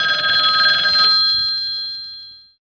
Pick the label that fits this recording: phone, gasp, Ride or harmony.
phone